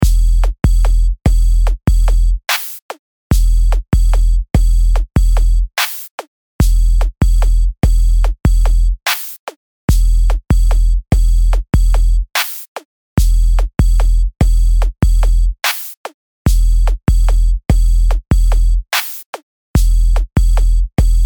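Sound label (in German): läuft bei mir 146BPM, Loops und Beats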